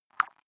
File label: click synthetic squeak soft, signale